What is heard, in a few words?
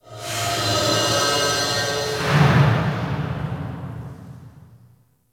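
Large Cargo Room Distant Ambience; Warframe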